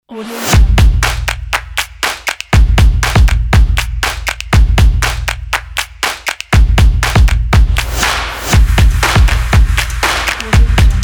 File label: upbeat fashion stomps sport; ramol music